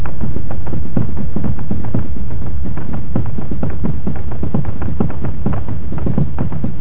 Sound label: Tire Flop